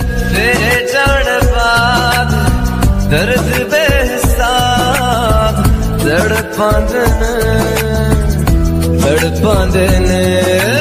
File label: sad music ringtone, bollywood